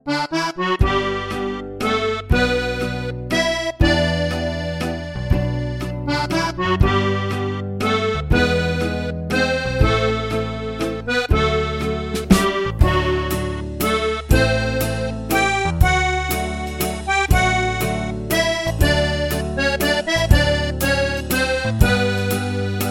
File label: Strasser Accordion; Akkordica